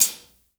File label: Hi Hat